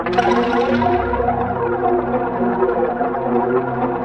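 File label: WATER WAVES; Pro-Rec - Synthsonic; JV FILM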